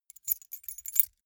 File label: key ring small rattle